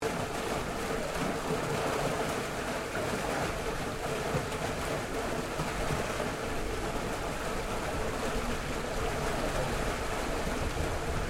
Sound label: Water Pool Filtration Drain Loop, recorded in a domestic pool; Various water sounds recorded in a domestic pool; Machinery; SoundEffects-Foundation4